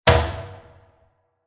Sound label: Plastic Impact With Hall; Impacts; Blasts